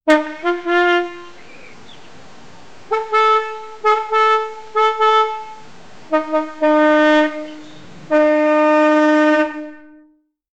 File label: circus clown horn honking sequence; SFX Engine